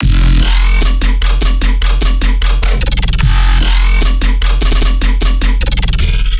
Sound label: Bass Loop 150 F; Trap and Dubstep; Anger; Ghosthack; Free Trap and Dubstep Samples 2018